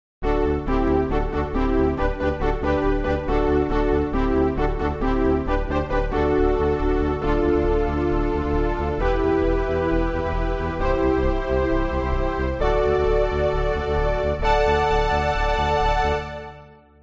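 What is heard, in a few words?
saw synth pad, Hohner ADAM 12-bit-Sound Synth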